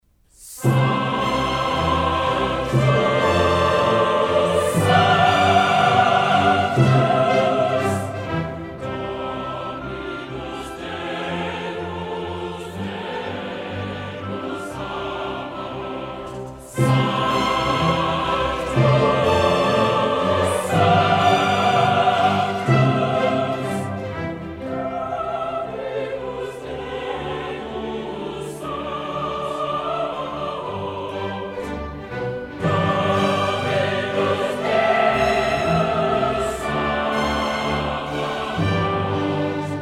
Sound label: Missa in C Sanctus; KV257; Credo; Mozart; Missae and Requiem; Davis, Gardiner